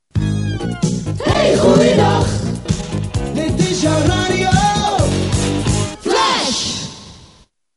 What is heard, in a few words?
jingle; Flash